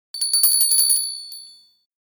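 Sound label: small bell; Smeshariki Cartoon Studio; Kolokolchik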